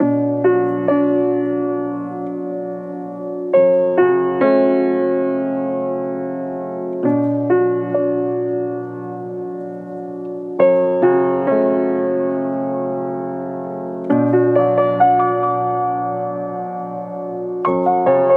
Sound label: 68 BPM F# Min, Piano; Passion; Cymatics; Bloom Piano Compositions